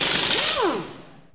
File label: generator repair